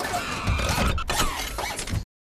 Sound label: pain knockback forward; Titanfall 2